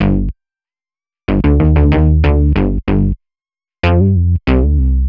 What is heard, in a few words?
HipHop Synthbass Gmaj, Hip Hop Bass Synthbass loop; Digidesign; Pro Tools Essential Loops